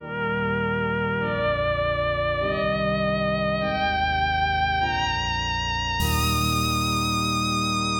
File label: victorious rising ensemble